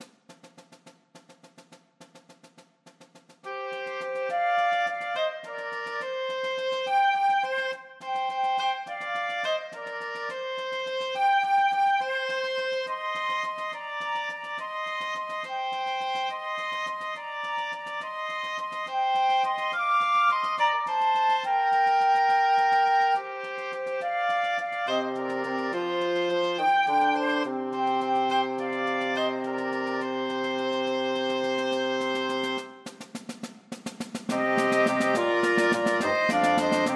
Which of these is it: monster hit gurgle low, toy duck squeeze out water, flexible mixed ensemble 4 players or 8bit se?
flexible mixed ensemble 4 players